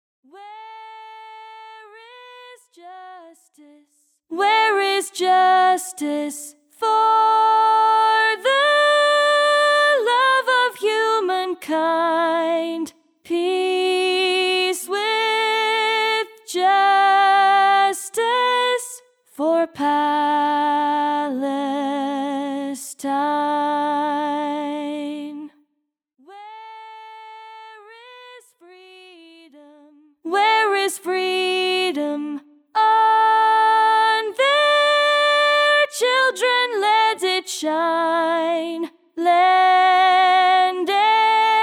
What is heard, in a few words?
voice